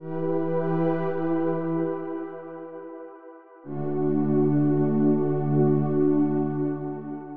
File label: SYNTH LOOP